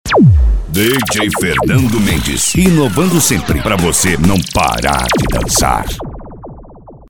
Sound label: trap funk